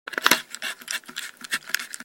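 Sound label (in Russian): скрипучий неприятный шуршащий звук закручивания бутылки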